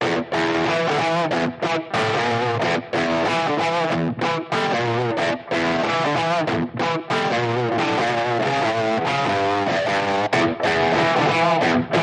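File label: Electric Guitar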